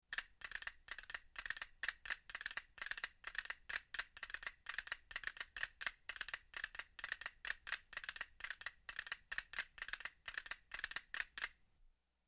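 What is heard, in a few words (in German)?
Drums Percussion Kastagnetten Rhythmus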